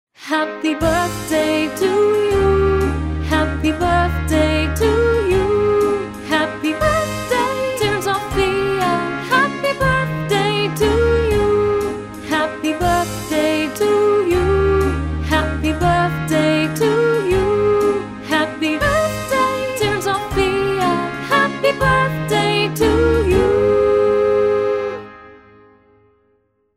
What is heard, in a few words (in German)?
happy birthday sophia